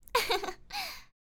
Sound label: Girl Laughing